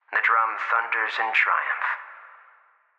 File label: Diary Drum